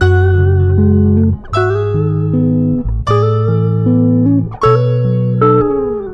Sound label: Loop